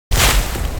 Rovi Hit

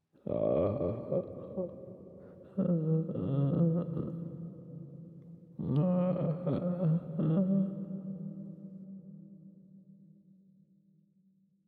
lamento uomo; Inquisitor 2

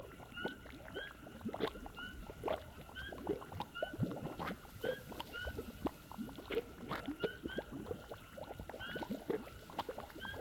Palude; BLIND QUEST - The Enchanted Castle

